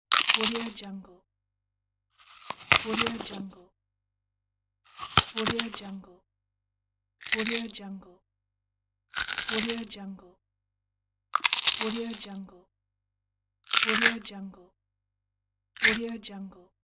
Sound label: bite; مجموعه صدای گاز زدن; human sounds